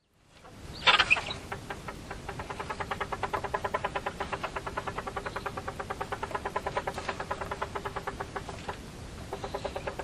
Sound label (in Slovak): prepelica japonska